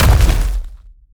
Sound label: Hand Hit; helltaker